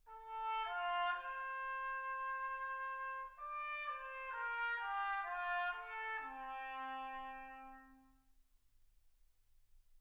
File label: trumpet